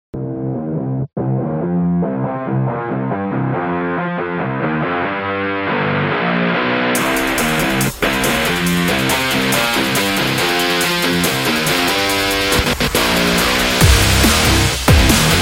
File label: Asphalt, metal